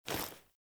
Female Foley Action Default Melee Swipe, Default Clothing; Characters; Player